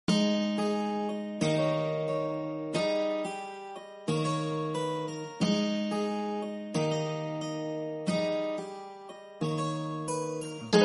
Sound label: Instrumental, ringtone